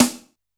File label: Open Rim Shot, Standard Kit, Yamaha PSR-E323